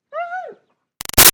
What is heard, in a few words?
Dog German Shepherd Whine Med Far; Dogs (Sound Effects:Animals); Big Room Sound